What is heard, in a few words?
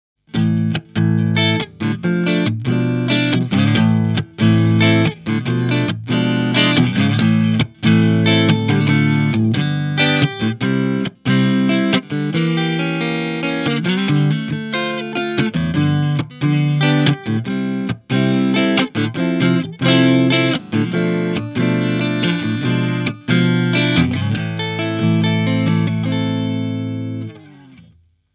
clean; ampvergleich